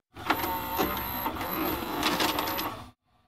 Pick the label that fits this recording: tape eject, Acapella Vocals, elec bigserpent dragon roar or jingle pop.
tape eject